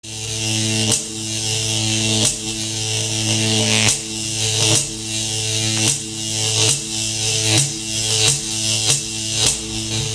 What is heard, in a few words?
electric; quake2; race